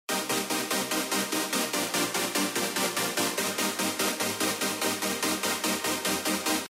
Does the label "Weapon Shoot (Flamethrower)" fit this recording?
No